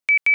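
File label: tone dispatch private receive; Motorola; i886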